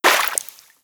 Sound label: debris water, bullet impact debris